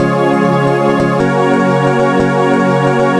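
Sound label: organ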